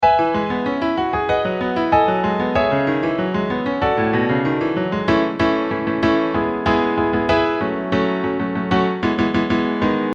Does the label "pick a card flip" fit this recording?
No